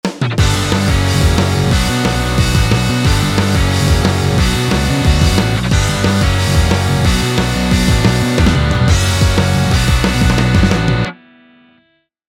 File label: Strum; Ample